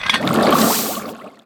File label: Teapot Attack